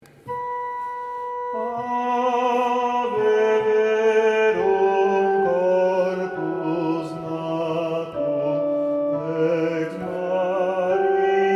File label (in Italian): Sop Cant; Ave Verum corpus-E.Edgar